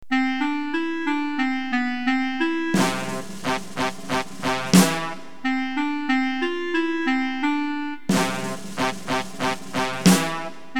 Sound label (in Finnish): Alkufanfaari